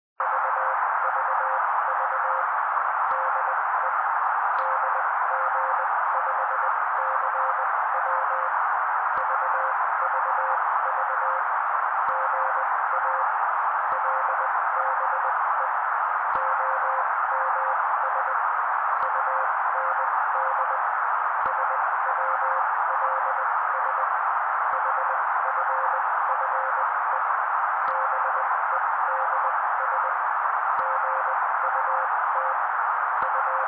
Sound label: 16 wpm noise; Daily Morse: Weather & Top Stories